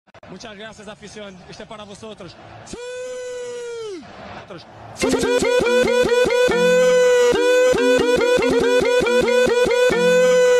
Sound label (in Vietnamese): nhạc chuông siuuu remix